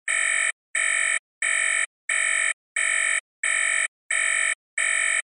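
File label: alarm clock sound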